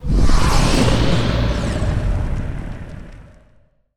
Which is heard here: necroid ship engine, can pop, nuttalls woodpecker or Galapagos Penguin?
necroid ship engine